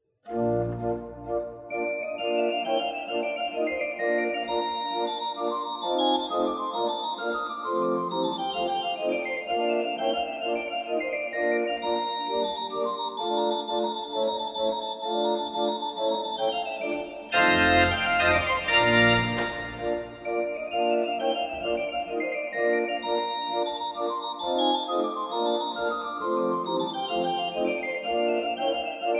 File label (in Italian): Decimino soprani